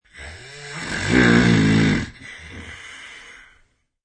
Snore

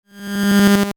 Feedback, Digital; Digital Sound Effects; Digital (Sound Effects:Sound Design)

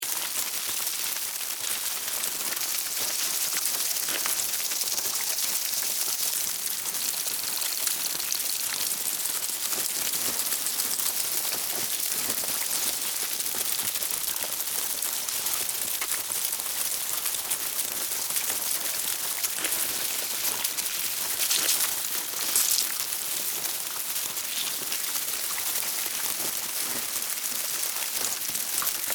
Infestation, Close, Insects, Bugs; Animals:Insects; Miscellaneous Insect; Blastwave

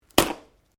Branches Dead Snap

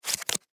Sports Poker Card Flip Over Set Down Single; Casinos

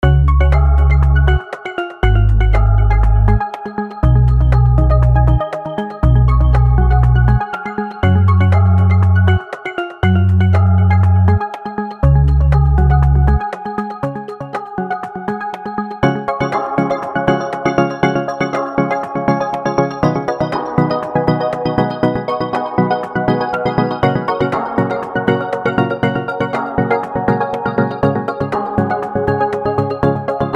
BEAT DELAY SYN, Beat Delay Synth Light; Miscellaneous Loops; Blastwave

